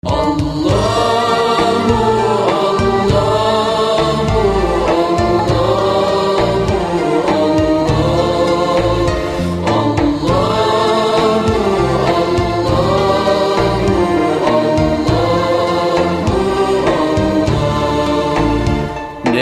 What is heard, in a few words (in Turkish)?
Islami zil sesleri